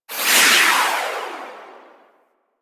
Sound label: Artillery Barrage Projectile; Weapons; Powers; Warframe; Archwing